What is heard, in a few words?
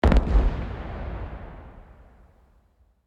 explosion far; War Thunder